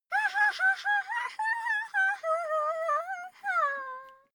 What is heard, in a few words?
child laugh fake high long